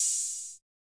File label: Open Hat; Khezie Hip Hop Kit